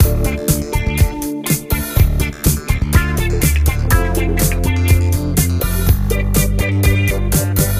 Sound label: Disco; Diner Dash Hometown Hero